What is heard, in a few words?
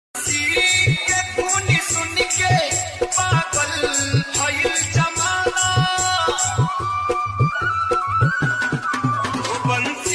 new ringtone hindi ringtone caller tune romantic ringtone